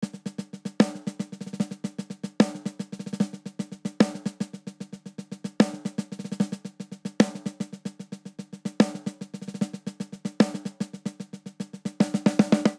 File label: Triplet Hip Hop Snare Only 75 bpm; 4 4; MIDI Clips; Ableton; Factory Packs; Drum Booth